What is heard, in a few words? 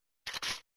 shotgun pump